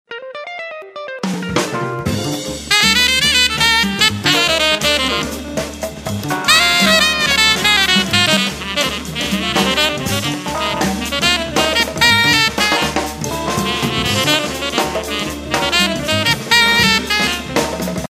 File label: Jazz bar, phonetones Samsung SGH-L708E